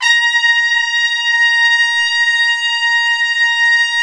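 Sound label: BRS, Trumpets Section 3 f; Roland L-CDX-03 Disk 2; BRS Tpts Sec.2-3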